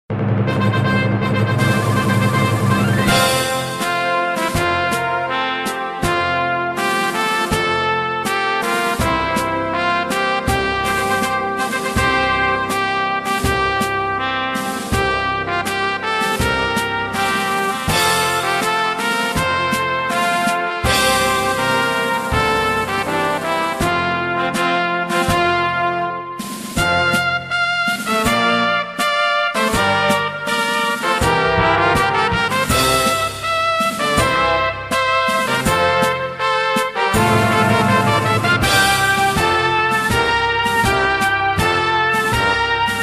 Himno de Emiratos Árabes Unidos - UAE National Anthem; Hino dos Emirados ÁRabes Unidos